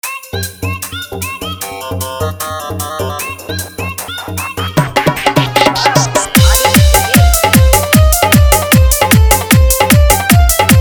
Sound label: Full Dhollki Bass Rod Dance Mix